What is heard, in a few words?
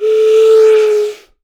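JAPANESE FL, BAMBOO FLUTE; Voice Crystal - Steve Reid Definitive Percussion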